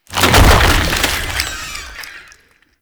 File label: Infested Lich Virulence Manifest; Warframe; Abilities; Zeke